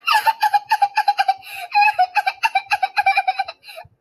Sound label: crying